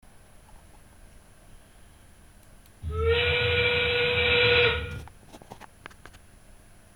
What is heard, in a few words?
OWL